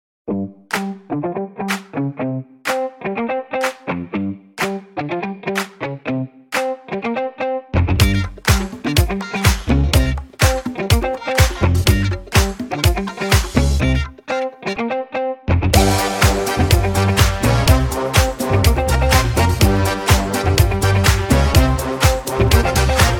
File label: funk